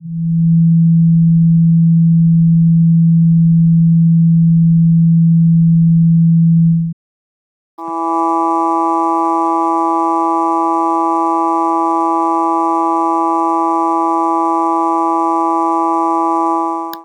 the pitch is NOT a Partial

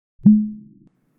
tea bottle

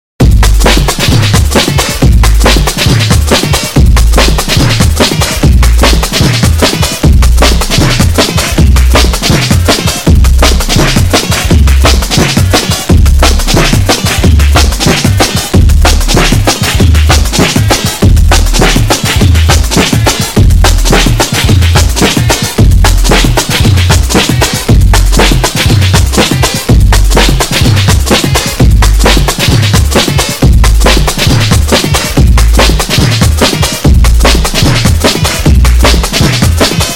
Risset Rhythm

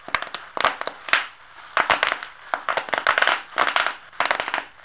bubble wrap high, office

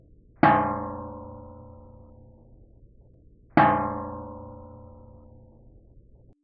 chair

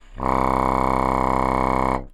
contra C reed

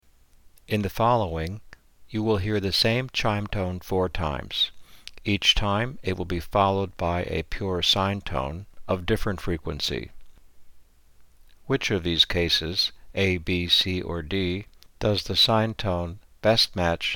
chime tone test

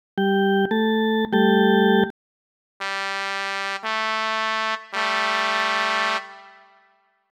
organ Trumpet G2 A2 dissonnance test

